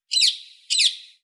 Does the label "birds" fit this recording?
Yes